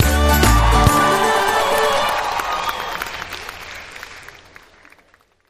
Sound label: megaboard win; Racetrack Riches